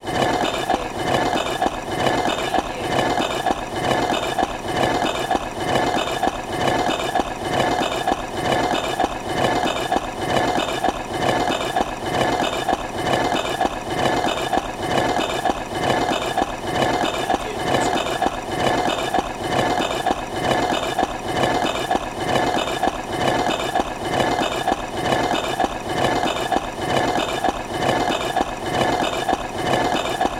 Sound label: Rock Machine Steady; sound effects bible